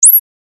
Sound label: lazer